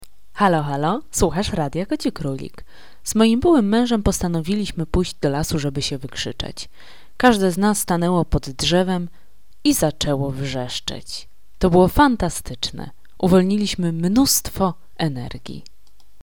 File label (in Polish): krzyk